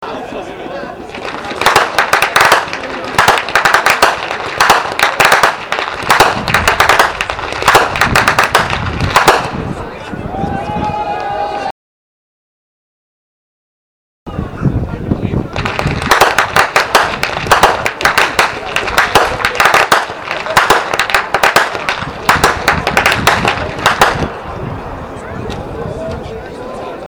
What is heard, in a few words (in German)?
schnalzen, jugend; liefering